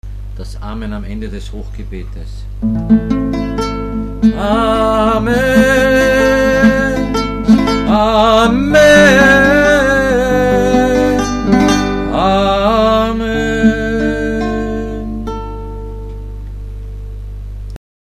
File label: Amen